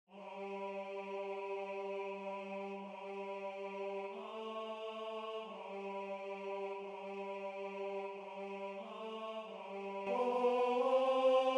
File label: Tenor